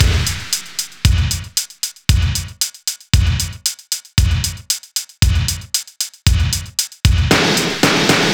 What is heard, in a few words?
Beat Hunted; Zero-G; Future Wave; Beats